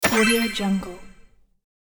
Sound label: magic archer arrow